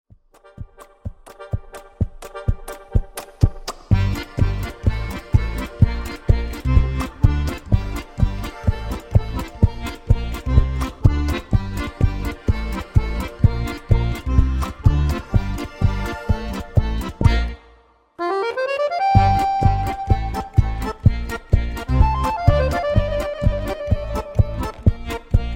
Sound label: accordion; zzmusic